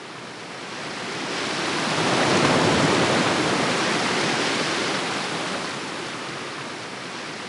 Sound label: surf